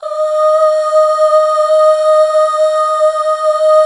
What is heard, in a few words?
HAL UUHH, HAL Back Uuh Fem